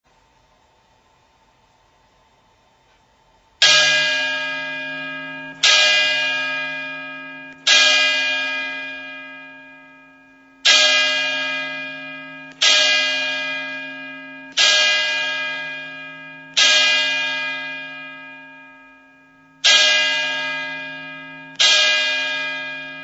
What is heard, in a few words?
Ave Maria App Ring; rome